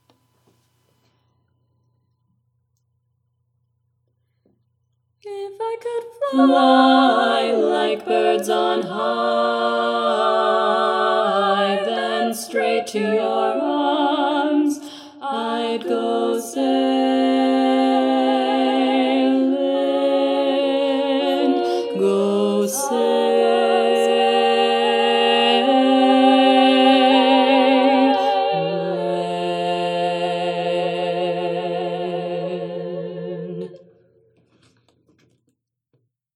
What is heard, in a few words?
Bass, barbershop tags